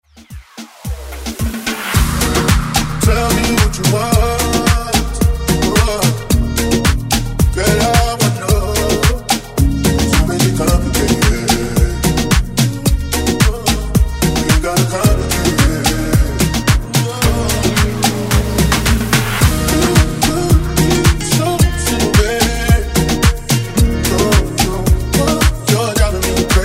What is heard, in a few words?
Reggaeton Redrum